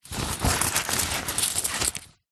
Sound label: foley money pouch fabric coins rummage; zapsplat